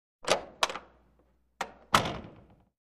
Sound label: Metal Door Open Close